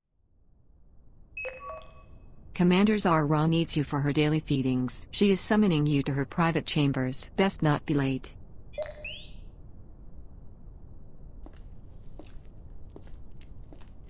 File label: ambience, SFX, wet noises